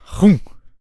Protector Attack Vocals

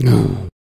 Stoner Death Vocals

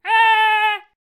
Attack Vocals; Da Vinci

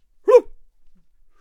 Bard Attack Vocals

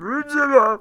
Ballista Attack Vocals

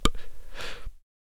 Gatling Gun Attack Vocals

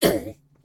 Death Vocals; Banshee

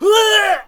Death Vocals; Musketeer

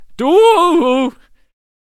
Attack Vocals; Dynamite Thrower

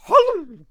Firework Archer Attack Vocals

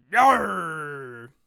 Bomb on a Stick Attack Vocals

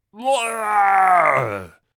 Void Monarch Death Vocals

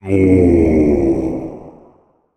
Reaper Attack Vocals

